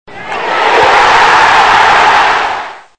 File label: goal sound